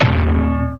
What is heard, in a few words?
anvil; Crash Bang Boom